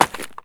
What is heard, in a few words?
gear helmet drop